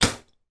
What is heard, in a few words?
human spit; sound effects one